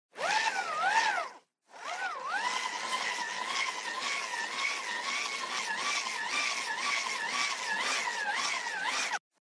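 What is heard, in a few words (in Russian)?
dynamo flashlight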